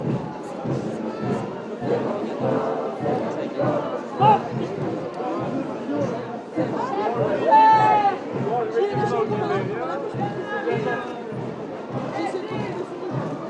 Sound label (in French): ambiance fin de cramignons; hermalle sous argenteau